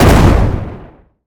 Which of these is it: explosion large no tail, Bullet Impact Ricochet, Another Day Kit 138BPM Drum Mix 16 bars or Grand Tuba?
explosion large no tail